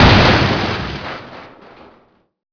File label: metal crash